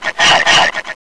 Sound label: bullchicken pain; svencoop; sence; mistake coop